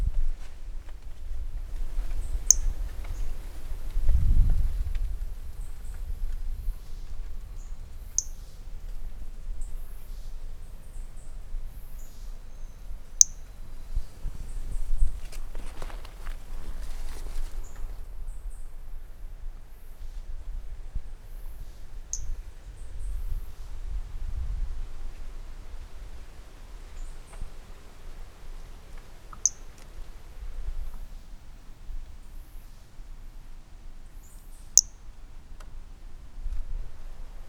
Brachycephalus mirissimus individual 20-50 cm first note missing